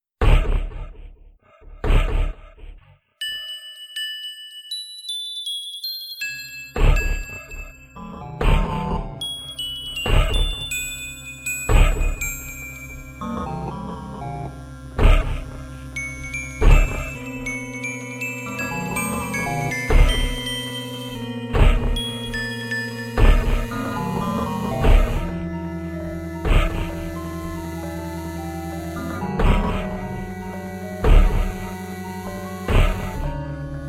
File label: Suspense; mogeko castle; windows